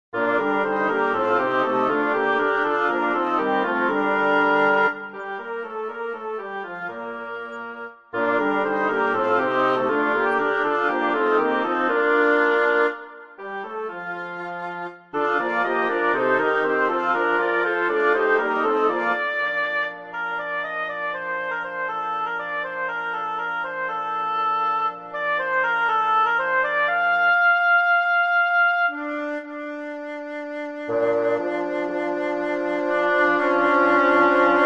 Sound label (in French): Extrait sonore généré sur MuseScore avec des timbres de bois; Durée totale : env 1min20; pour chœur; M'sieur-Dame; Pièce en attente de création